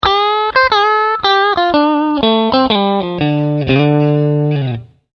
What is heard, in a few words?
Strat Solo